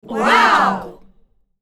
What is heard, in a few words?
female crowd says wow